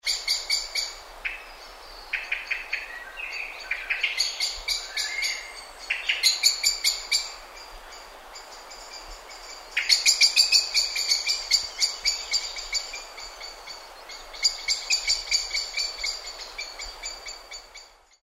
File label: blackbird